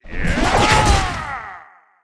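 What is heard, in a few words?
ghost captain attack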